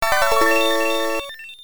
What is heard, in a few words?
Open Door; Neutopia; TurboGrafx 16